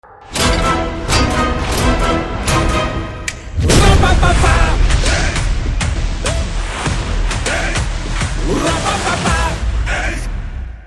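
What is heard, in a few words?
Trailer background music Ringtone; Valimai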